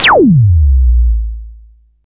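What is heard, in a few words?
FX right, attacks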